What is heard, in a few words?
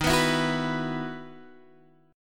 E Major 7th Suspended 2nd